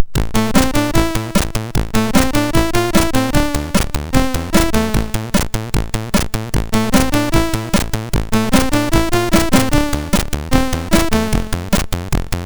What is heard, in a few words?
sid, MOS6581R2